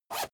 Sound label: zipper use short fast